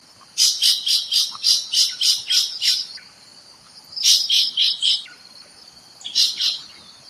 Large Woodshrike calls, Kledang Sayong Forest Reserve Ipoh Perak Malaysia